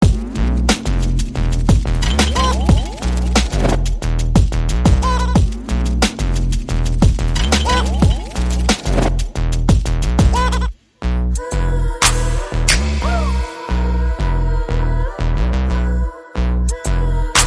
No Piano Melody

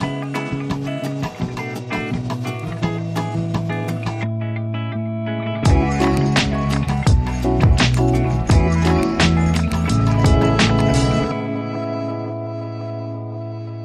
Barrio Groove

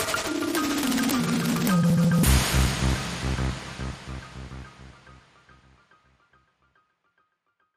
Pastoral, Sting

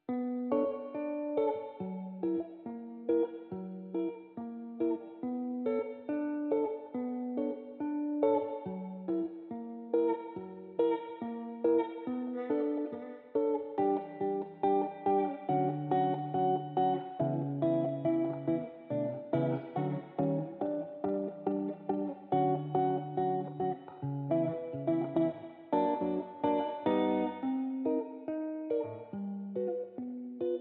Guitar

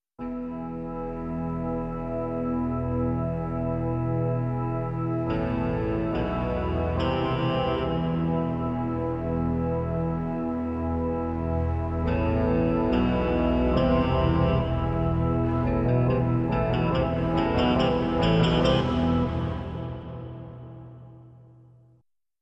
Organ Suspense

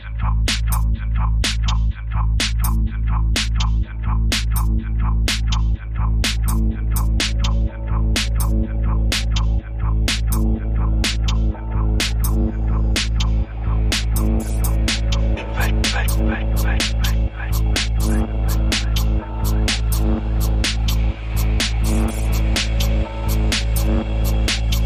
Drums Vocals Minimal Version

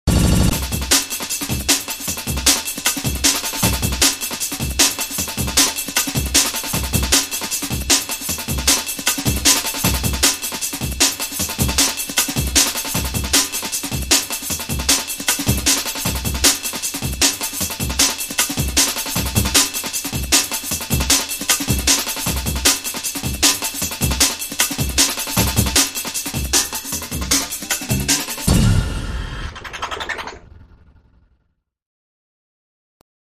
Drum Launch

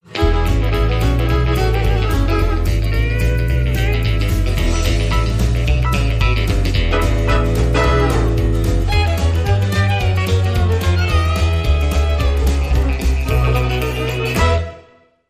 Walking Bass

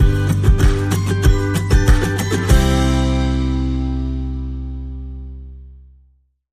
Bumper

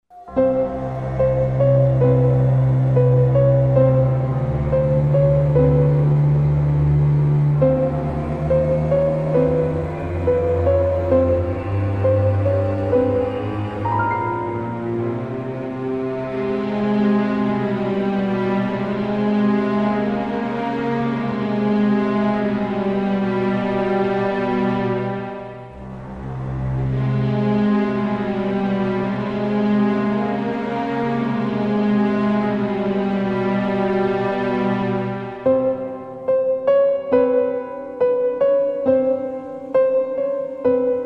Cemetery Bell

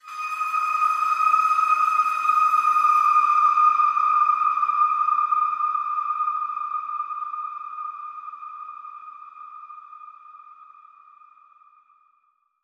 Whistle Bend

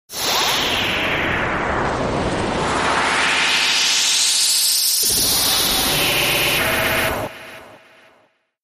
FX Space Delays

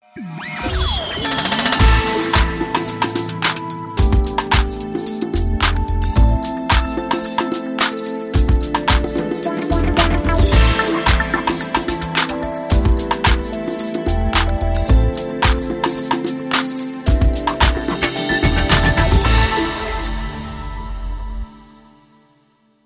Easy Ramp

